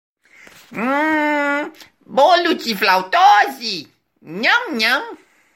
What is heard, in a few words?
flautose; picapau